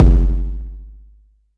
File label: BD